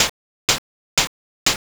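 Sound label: footsteps poolside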